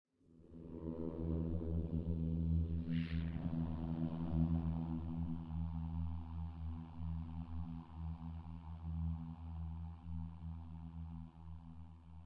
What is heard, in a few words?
machine idle, monster